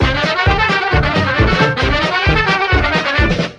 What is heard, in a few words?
horns, phonky loops